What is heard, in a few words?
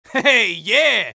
scout taunt exert; taunts; tf2